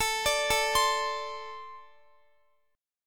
A Minor Double Flat 5th, down, Guitar; Ambb5; arpeggiated

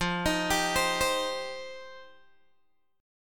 F 7th Suspended 2nd F down Guitar Open G

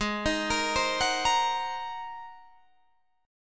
G sharp 9th, Guitar, Open D

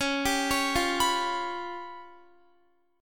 Db 7th Flat 5th, Guitar; x,16,17,18,17,18; Db7b5 arpeggiated

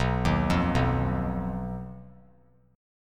B Major Flat 5th B, chord; BMb5; arpeggiated

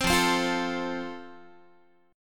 F Major, Guitar; strummed; chord

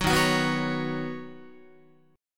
D sharp Minor 7th Sharp 5th, Guitar; D#m7#5 strummed; 4A,4E,5A,5D,5G#,6C#; 9,11,9,9,10,12

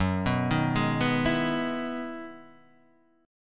E Major 9th F sharp; arpeggiated

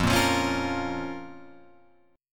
F Suspended 4th Sharp 5th F down Guitar Open F; Fsus4#5 strummed; JGuitar

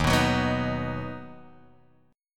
Eb Minor Sharp 5th, down, Guitar, Drop D

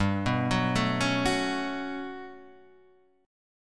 G Major Sharp 11th G down Guitar Half Step Down

